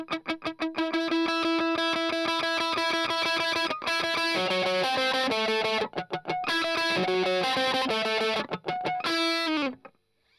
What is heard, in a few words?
Strum Octav